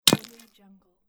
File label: metal impact on ice